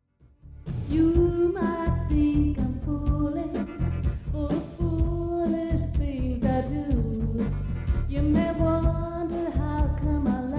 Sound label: Rock & Pop